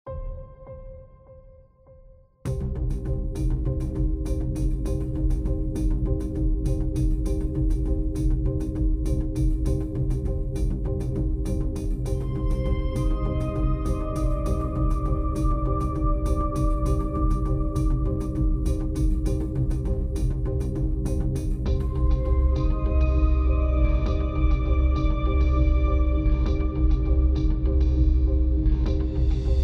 Electronic Variation; Marble Land; Ion Rise

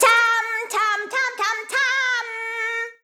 Fanfare (German); Hyrule Warriors Age of Calamity

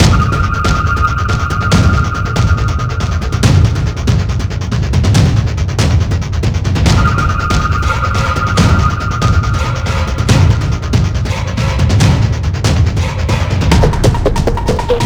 Intense Attack

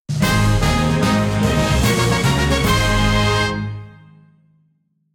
Jingle Success; Pokemon Masters EX

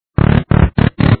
Mushroom grow

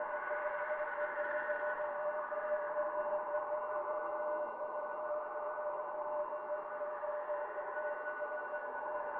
dream dialogue loop

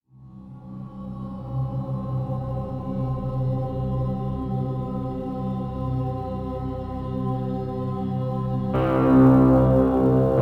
Loading; dying light gamerip 2015

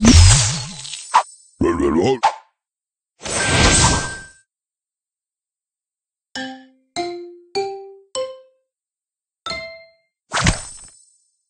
Purchase; Candy Crush Soda

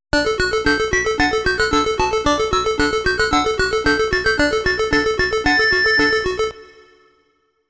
game start bgm; arabian night; Legend Compilation Series

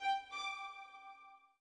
orbit violin; Sky Children of the Light gamerip

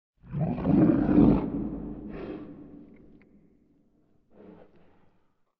Creature; we are the dwarves gamerip 2016

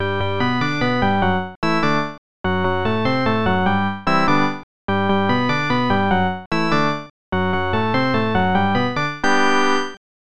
Stadium Organ; ESPN National Hockey Night; snes

